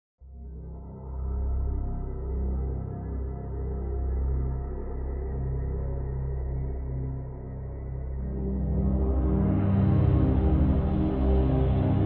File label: instrumental song